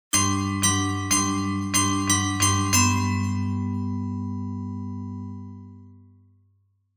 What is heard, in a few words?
Music box